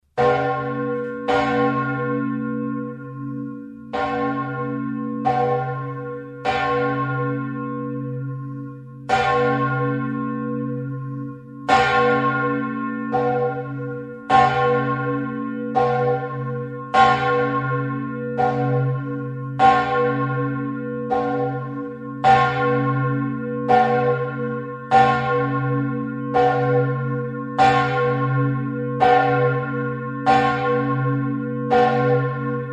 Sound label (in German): glocke gross